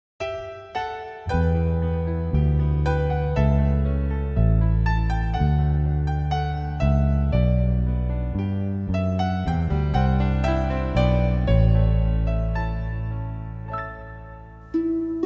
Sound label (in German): Harfen